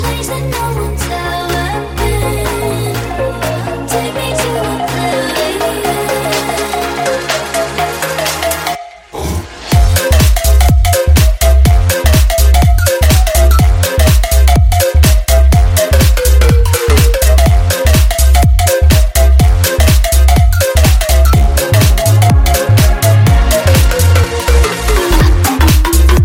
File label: Ringtone